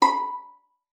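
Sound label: violin pizzicato B5; Xsample VOL-5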